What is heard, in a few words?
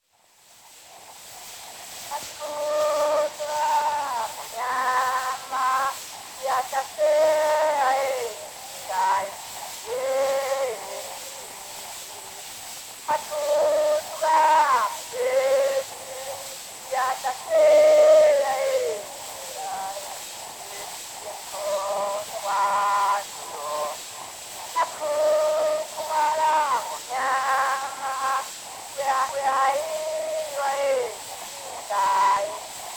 Zulu Recordings; Father Franz Mayr; Ihubo lenkosi